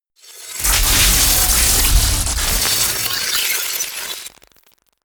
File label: disintegrate